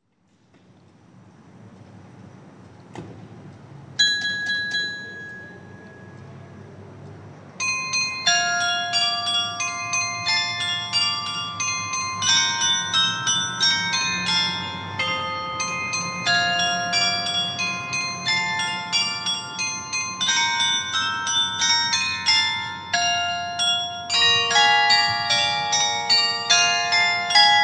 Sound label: carillon; Sinterklaas